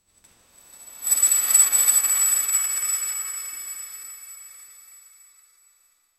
wand casting a spell with a sparkling sound

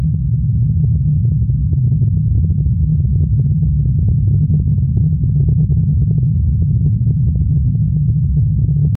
spaceship engine hum in deep space

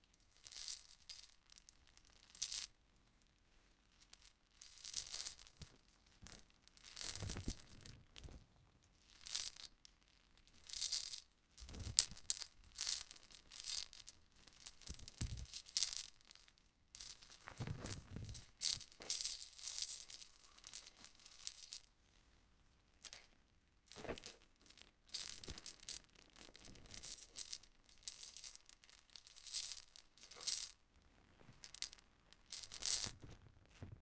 a very thick steel chain

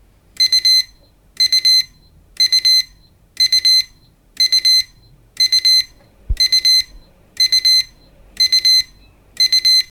gentle alarm clock beeping in the morning

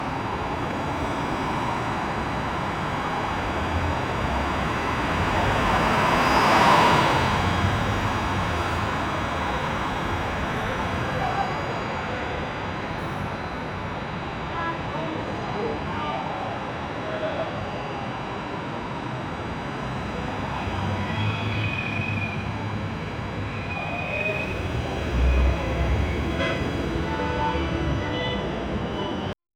new york city street ambi

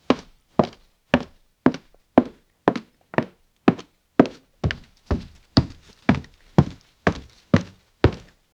heavy boots clomping on a wooden floor

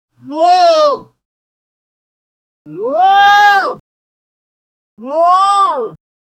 enthusiastic yay after winning a prize

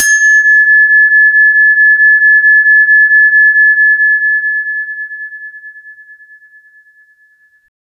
distorted and aggressive

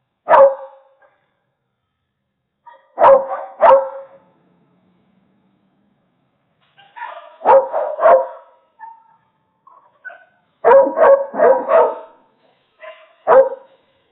dog growling then barkin

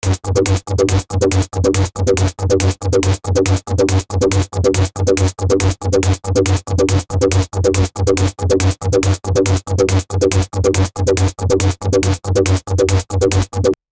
create an electro synth b

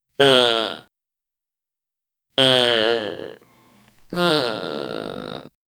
human flatulence short

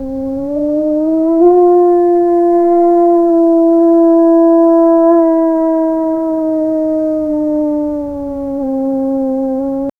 werewolf howling at the full moon

